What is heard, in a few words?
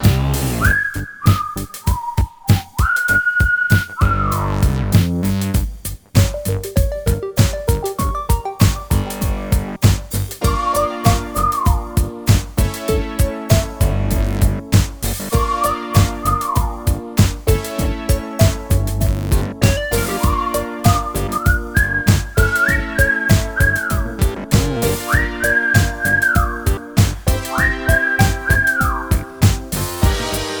concert